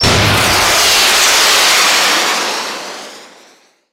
rocket

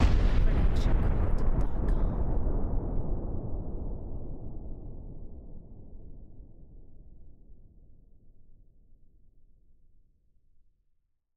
Deep and Far Explosion; SoundsCrate

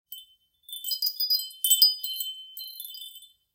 Wind Chimes D

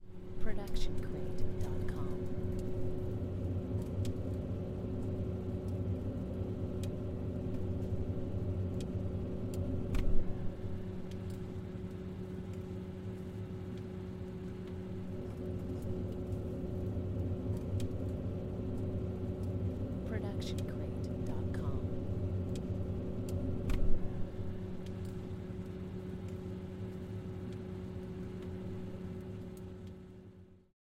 fridge hum; soundscrate

